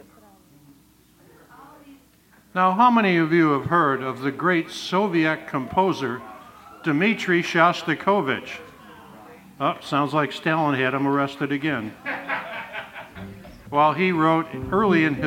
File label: WALTZ